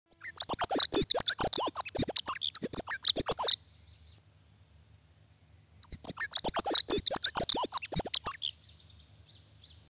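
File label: dunnock voice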